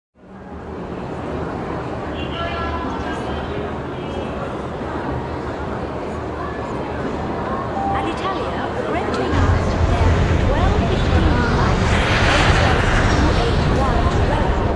busy international airport sounds airplanes crowd announcements